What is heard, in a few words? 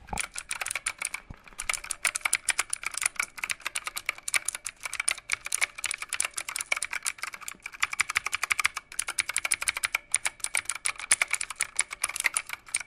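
mechanical keyboard with blue switches that click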